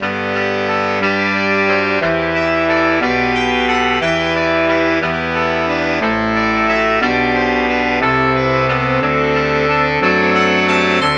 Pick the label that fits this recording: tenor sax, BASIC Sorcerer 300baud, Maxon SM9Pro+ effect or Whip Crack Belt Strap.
tenor sax